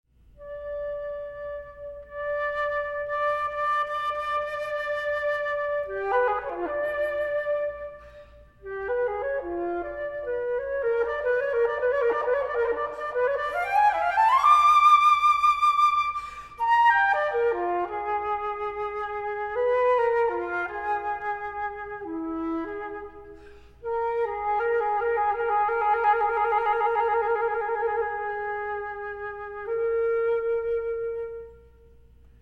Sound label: flute